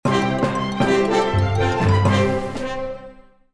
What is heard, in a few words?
lv up